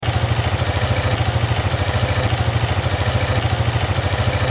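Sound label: 1500 revolutions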